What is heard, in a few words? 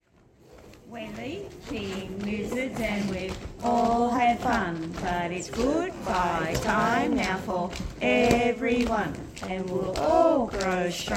song